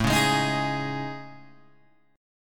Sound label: A Major Sharp 11th, Guitar, Open G